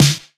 smooth steel snare drum sound D key, steel snare drum sound tuned to the D note; snare drum samples